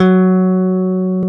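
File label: Bass Electric; CMI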